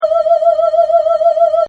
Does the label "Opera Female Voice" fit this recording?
Yes